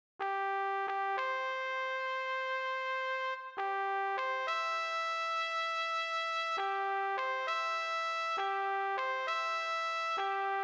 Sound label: taps; july4th